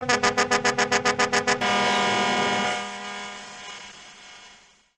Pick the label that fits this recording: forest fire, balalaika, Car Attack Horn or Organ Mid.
Car Attack Horn